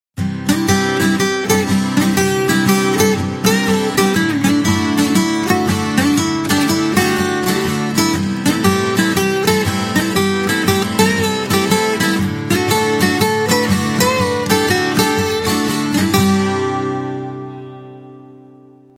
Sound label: Strum n Play, ringtones; Micromax; Bolt Warrior 1 Plus Q4101